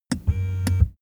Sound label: car seat massage engine short